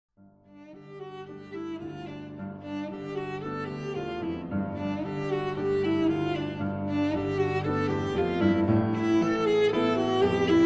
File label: Sonata for Cello and Piano